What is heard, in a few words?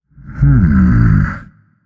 thinking; smite; voicepack; set